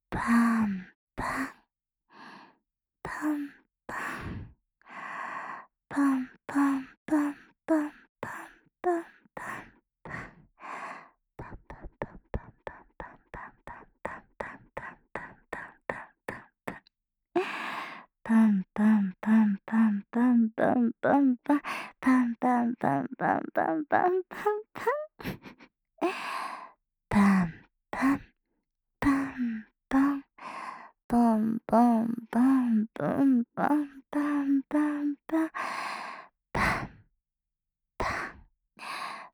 ぱんぱん, 左耳

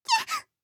きゃっ！, ロリ; ハイグレボイス集

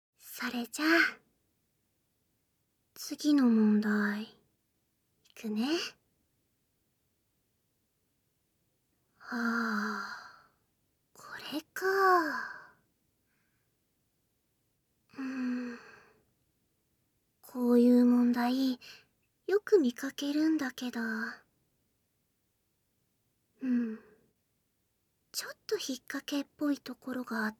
耳舐め・手コキ; えっちなご褒美、いっぱいあげる♪

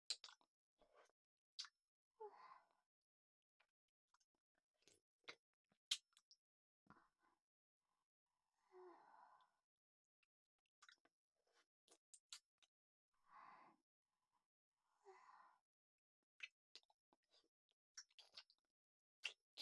乳首舐め左; みみもと☆あだると放送局ver2; バイノーラル録音

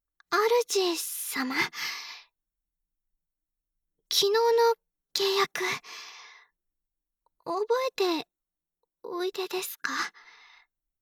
囁き、耳舐め; 手コキで気持ちよくなってください

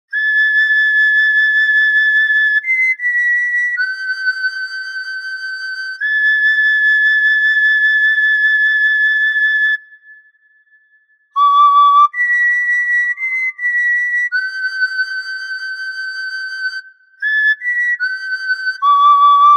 草笛メロディ

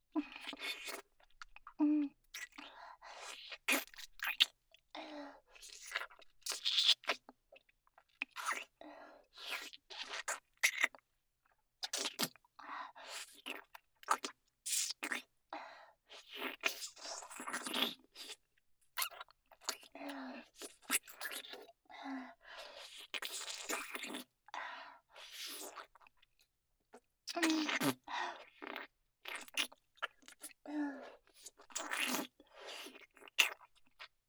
ゆっくり咥えるフェラ

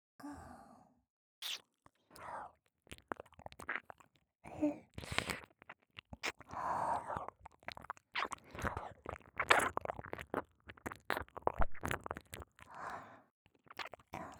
耳舐めオンリートラック, ASMR; SEなし

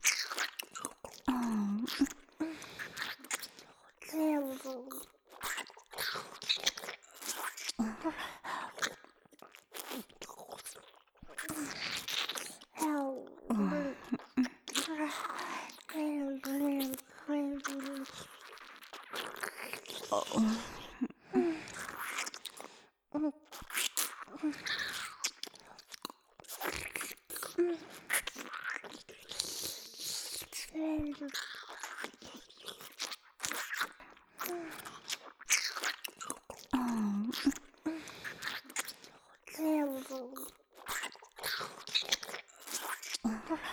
ループ 両耳舐め 強; 人外姉妹のダブルフェラ～囁き声と耳舐めで脳みそトロトロになるまで嫐り犯してあげる～; 妹すたー

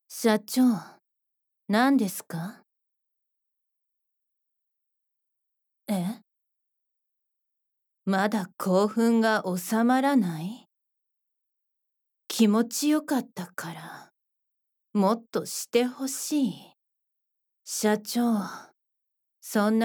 秘書さんに事務的に耳舐めとパンツ手コキされる

